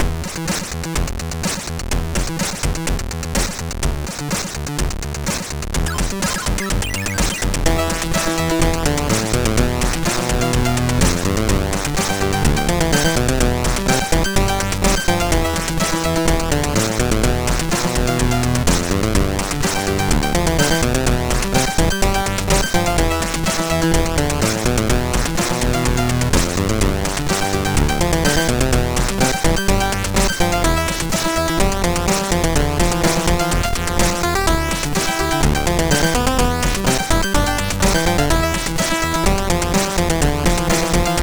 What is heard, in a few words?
MOS6581R3 sid; Quickshot; Dexter